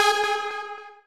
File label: lead1 g#4